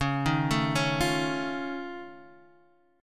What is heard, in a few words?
F Augmented 7th C sharp, chord